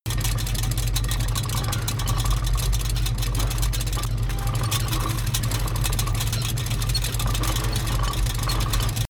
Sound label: car engine damaged idle